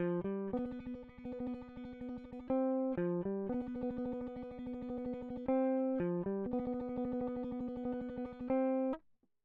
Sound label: electric